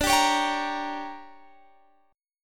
D Major 7th Suspended 4th Sharp 5th Guitar Drop D, chord